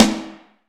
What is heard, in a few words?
SNR DARK, snares; Roland - Rhythm Section; Snares 4; Sn Modules 4